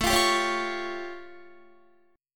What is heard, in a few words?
A Augmented 7th A Guitar; A+7 strummed; 17,20,17,18,17,20